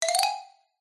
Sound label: cartoon xylophone short fast ascend; zapsplat